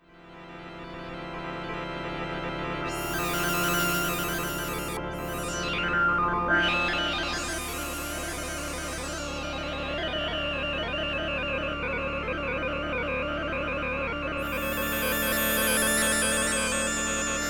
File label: F drone